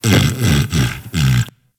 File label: Kubrow Tame Sleep Idle Snore D; Warframe